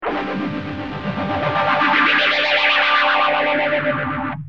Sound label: gtr mam rs3